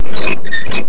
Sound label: pulley